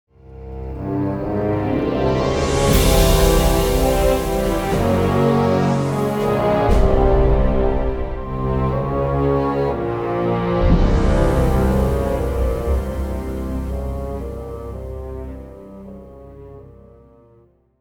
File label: music; freelancer-hd-edition; losangeles